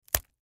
Wire Cut Blue Bomb, Wire Cut Blue Bomb Squad; Disarm Bomb; Emergency; Miscellaneous Combat Sound Effects

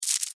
Spider Web Shoot, Short; Animal; Arachnid, Insect, Bug; BlastWave

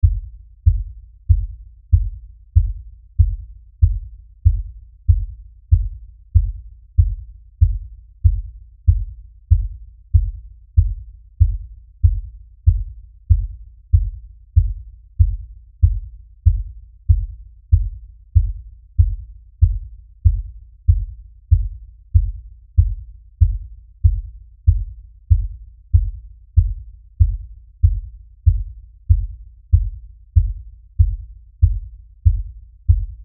Lfe Beat Low Bass Pounding Rhythm; Miscellaneous Musical; Blastwave

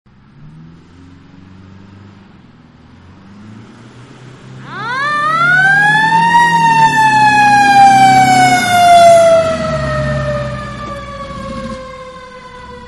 Fire Engine-Siren-Pass By; Emergency Vehicles; Manhattan Production Music

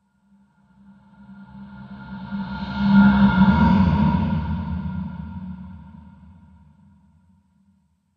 Ghost Phantom Horror

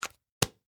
Household Hairspray Cap Close; Bathroom (Sound Effects:Household)

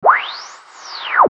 Static Long Frequency Sweep; Production Element Imaging Element Accent Transition; Blastwave; Static Sound Effects

